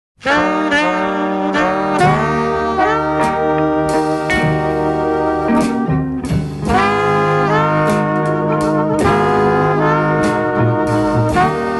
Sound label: blues